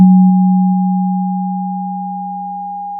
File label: Sound of a church bell